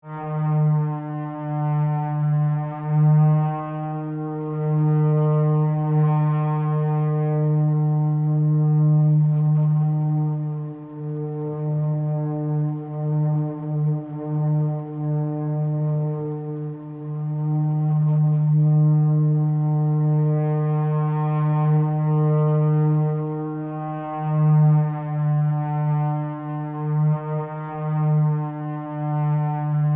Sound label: propeller